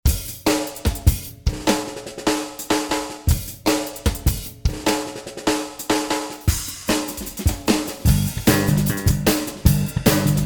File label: 2 Kit Jam 150